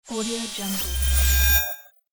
scifi device

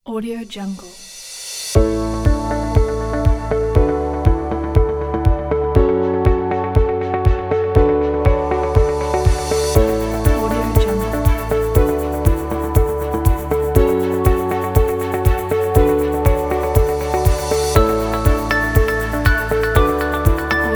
ambient background

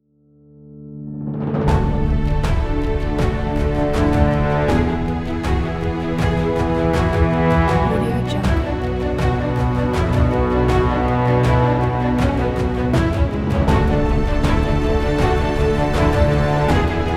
the strings

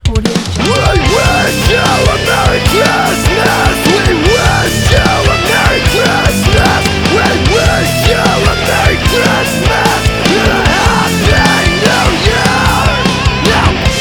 extreme christmas

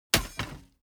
impact scrap drop metal bar small

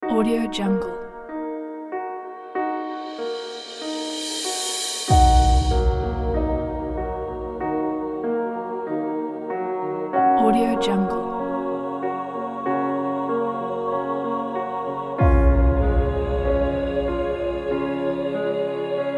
emotional piano and orchestra kit

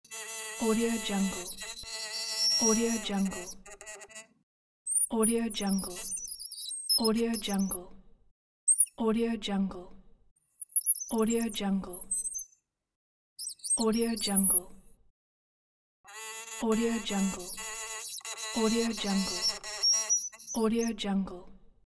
creaky sounds

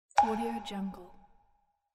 water drop

